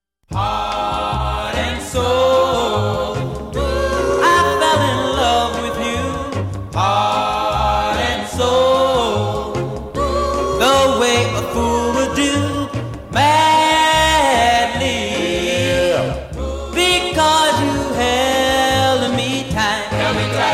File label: doowop sounds